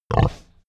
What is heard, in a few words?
pig grunt